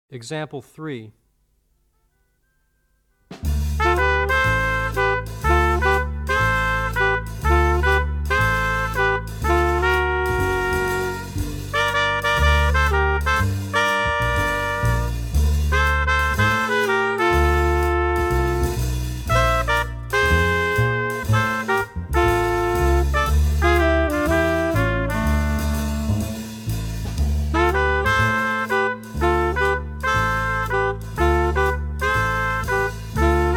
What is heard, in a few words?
two horn audio examples